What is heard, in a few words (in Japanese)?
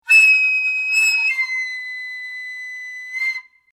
歌舞伎風の勇ましい笛の音